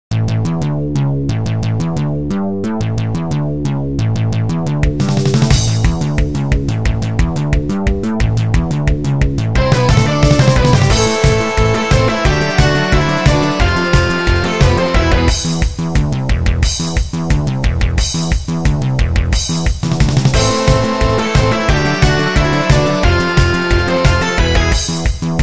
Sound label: game dangeon; maou